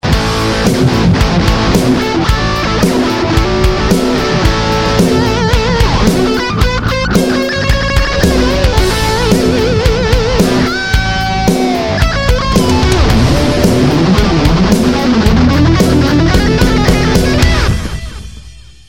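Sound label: extreme, guitar; amtelectronicsusa; extreme demo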